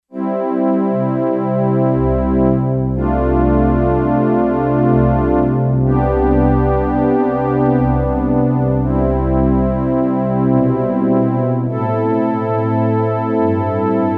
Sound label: Strings, Yamaha DX11; Factory Preset Voices